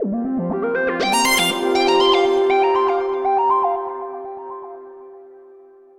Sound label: Laser Hockey - Player 1 Goal; Wii Play